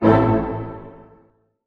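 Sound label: A minor pianissimo, orchestra hits; Sound On Sound - Peter Siedleczek's Orchestra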